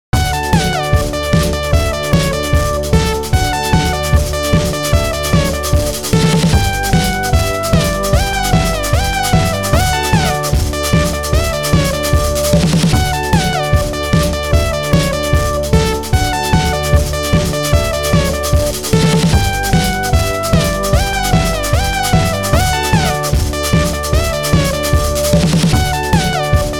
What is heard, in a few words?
Funny Runing